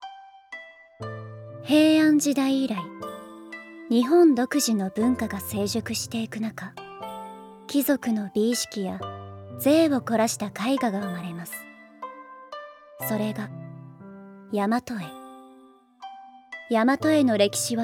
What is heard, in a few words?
BGM